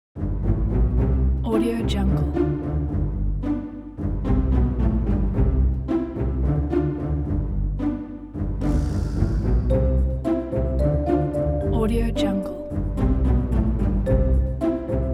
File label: cartoon music